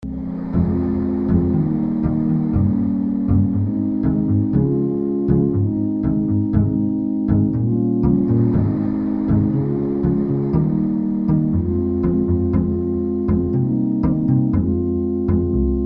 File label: The Bad'n Sad Ballad; heart chain kitty